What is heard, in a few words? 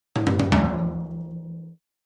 Drums Toms A Accent